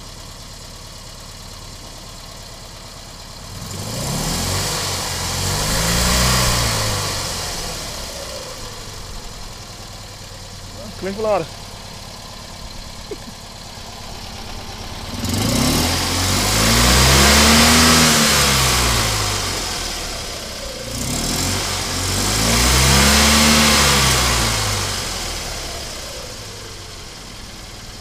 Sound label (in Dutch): geluiden uit hengelo